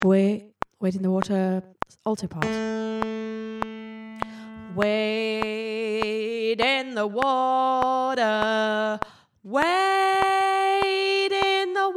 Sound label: alto, gospel choir; wade in the water